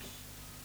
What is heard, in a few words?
CHH, Dance Drums